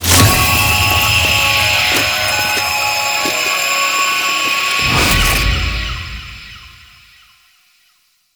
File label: Veil Struggle Start; Stolen Plates